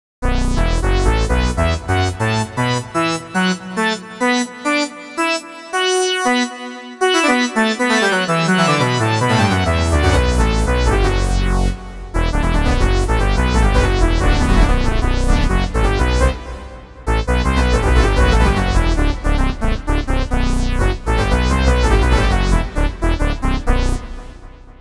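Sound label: rhythmic delta velorum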